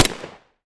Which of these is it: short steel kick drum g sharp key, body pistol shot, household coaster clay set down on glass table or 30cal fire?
body pistol shot